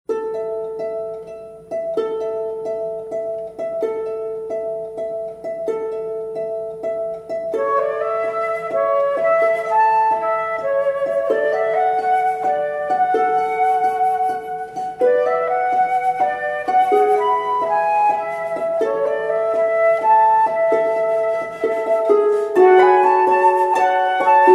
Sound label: Harp Flute